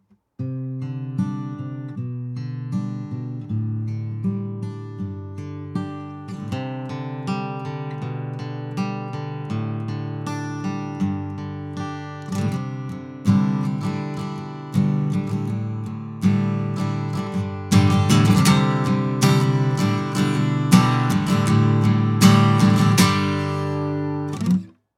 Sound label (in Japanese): sm アコギ エフェクト無し